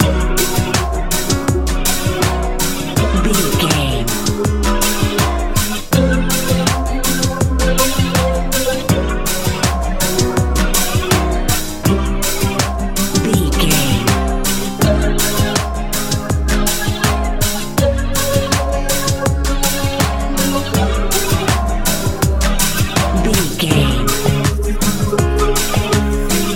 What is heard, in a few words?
techno